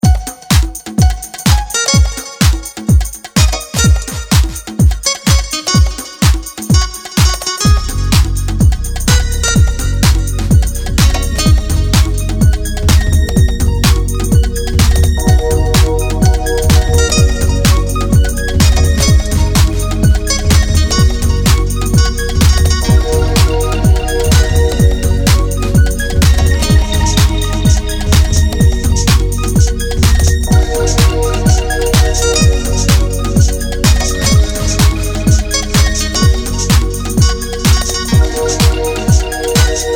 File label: Ibiza Guitar